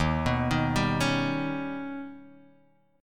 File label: Eb Augmented 7th, Guitar, Drop D